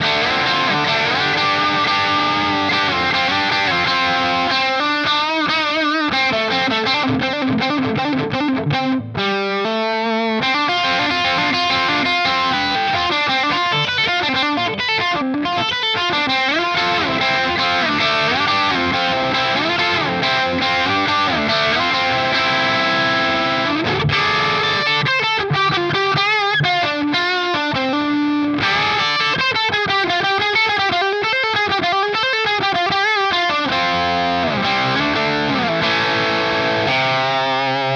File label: Harmony Guitars Silhouette Bridge Clean Fuzz